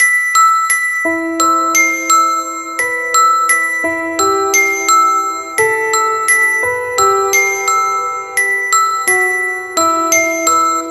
Iphone 6 Alarm Bells

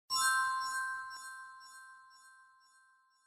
Drynnn

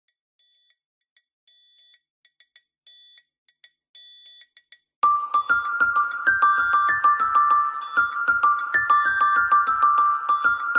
huawei ringtones

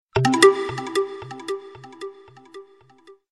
Blop Sms

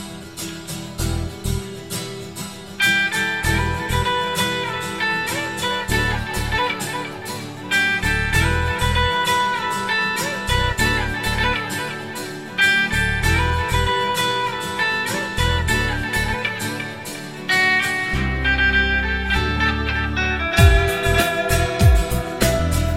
Guitar

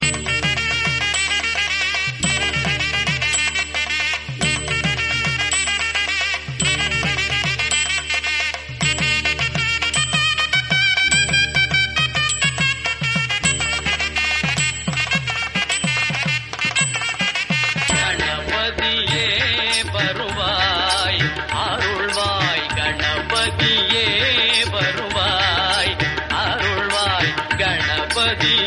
Hindu Devotional Ringtone

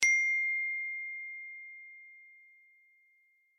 Ios Note

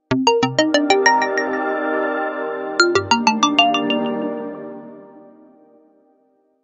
Effect Message

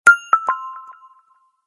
Simple Sony Sms Tone

